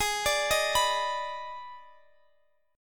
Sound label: D sharp Major 7th Suspended 4th Sharp 5th, G sharp